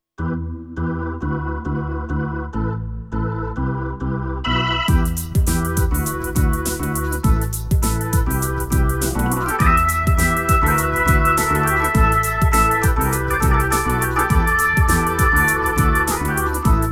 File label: B3 Organ; Masterbits CLIMAX 8